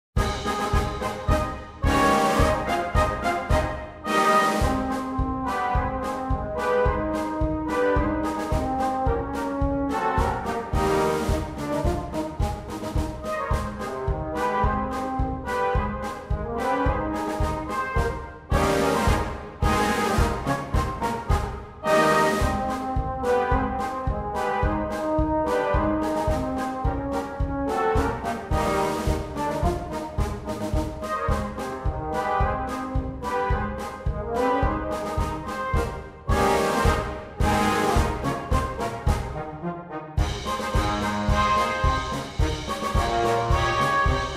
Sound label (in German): Saratow-Marsch